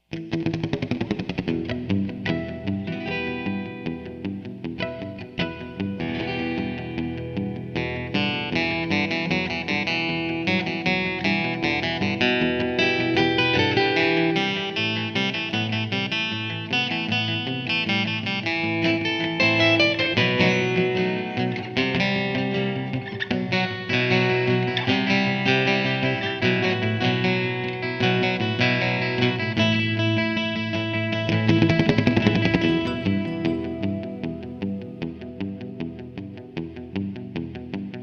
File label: guitar